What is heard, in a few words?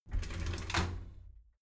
shower slide door inside open regular halfway